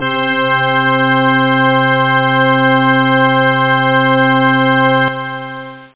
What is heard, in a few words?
Church Organ; CrapTrack